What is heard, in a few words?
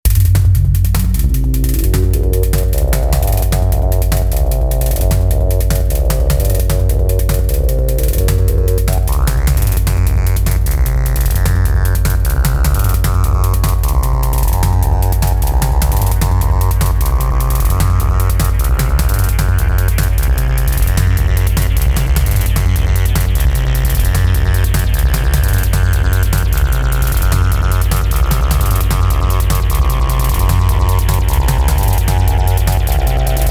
short acid seqeuence